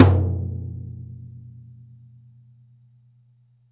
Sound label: TOM D, Drum Dry; East Collexion; Drum 1